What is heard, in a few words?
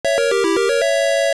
Battle; Overworld battles begin with a harsh musical sting; FDS; Zelda II: The Adventure of Link